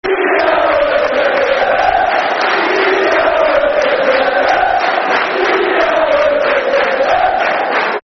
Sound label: Football Chants; Stevie Howard; Derby County Dcfc